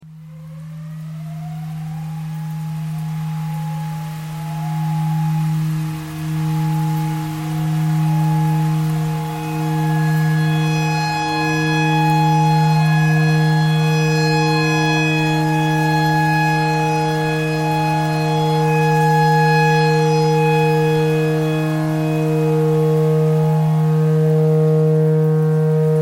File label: tornado siren clarinet notes e pentatonic